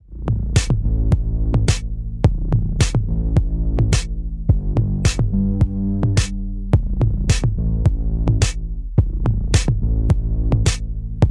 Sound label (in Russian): muzyka v mashinu bass boosted